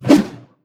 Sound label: swing blunt; blunt1hand